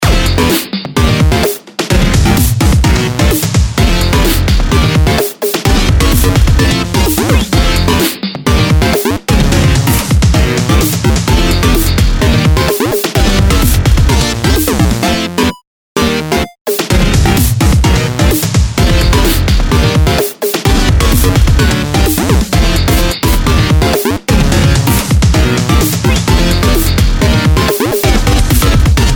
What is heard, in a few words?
Synth Loop